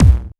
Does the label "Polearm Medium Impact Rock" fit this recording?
No